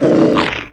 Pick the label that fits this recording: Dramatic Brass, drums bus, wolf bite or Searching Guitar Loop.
wolf bite